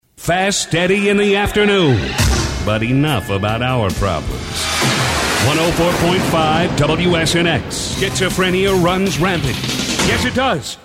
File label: Sweeper Montage; Flash Flood